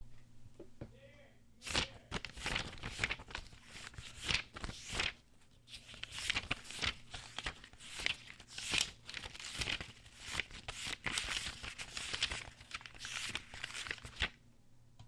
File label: page turning